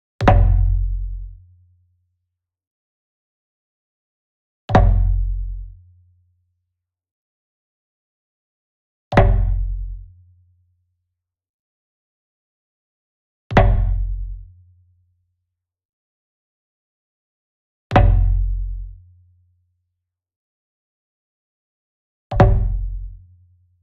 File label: Wood Percussion Big Bom Flam Near; boom library; Cinematic Strikes Construction Kit